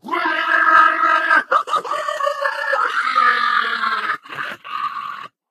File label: ballbot jump